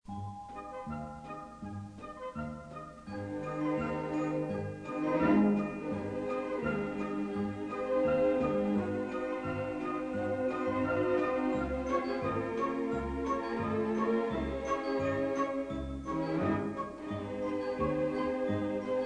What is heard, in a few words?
Chime Song